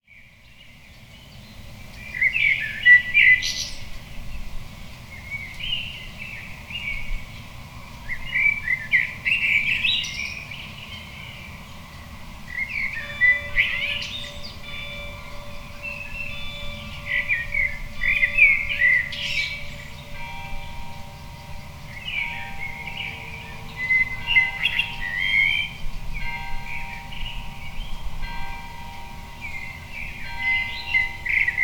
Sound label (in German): Amseln Glocken